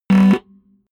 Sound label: button, signale; maschinen; soundpool